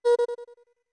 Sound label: Notes Voice B short; OOT